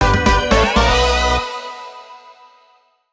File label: Music event; Power Pro Kun Pocket R